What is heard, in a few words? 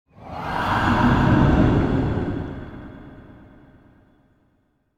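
mystical breathing short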